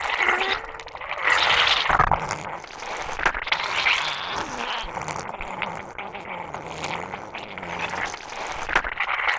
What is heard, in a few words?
Plasmidas Sing